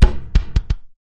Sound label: chest Land